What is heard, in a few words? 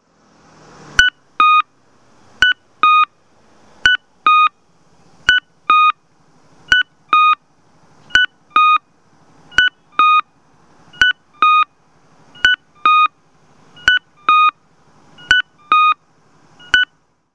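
cuckoo, traffic signal; kyosan LTA-SBSK-B; onkyo kosaten; kyoto; yawata; yawatajiku; kakko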